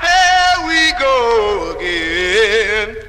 here we go again group of men SUNG

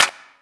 Clap n Snap, Snares

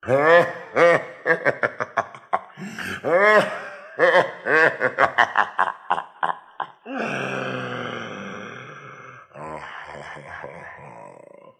laugh long; Voice; ooh ahh

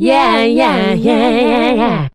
yeah yeah yeah yeah sung; ooh ahh; voice

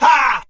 "ha", Voice

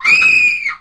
scream female; ooh ahh

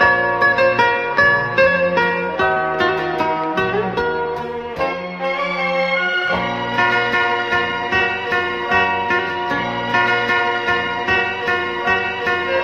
guitar and strings; Loops

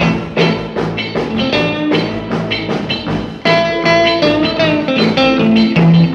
guitar hoedown stomp; Loops; Phonky

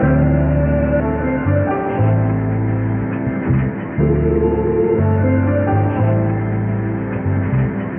keys

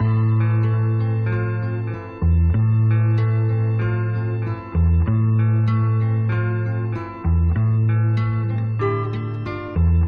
guitar and keys, Sad

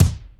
gallumping, Kicks Medium

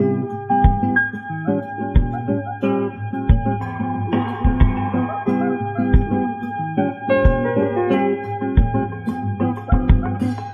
piano; Phonky

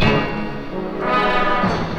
horns, Sad; Loops

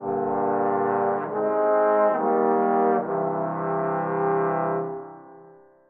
horns, Sad; Loops

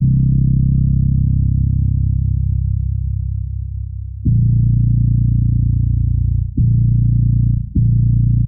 bass

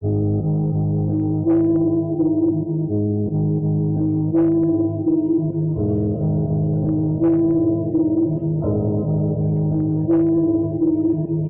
keys, Loops, Sad

